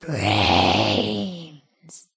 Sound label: Beach Groan